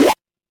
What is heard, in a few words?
retro game combo